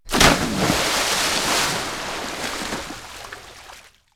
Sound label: Big Splash, perc fx; X-Static Goldmine